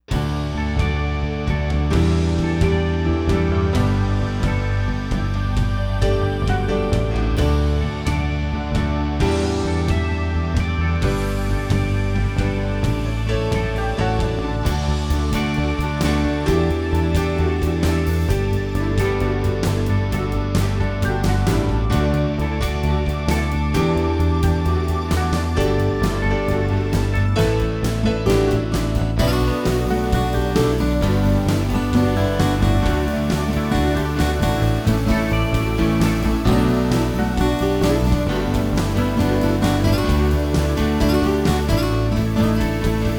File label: Quiet Build; Title Lock tracks